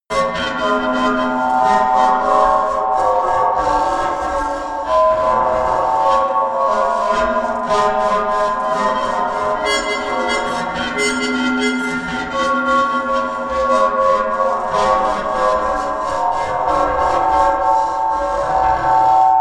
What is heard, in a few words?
drone monkey drone